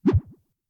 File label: pop; 落下音・はねる音